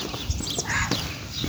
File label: Boat-tailed Grackle, Quiscalus major; Aves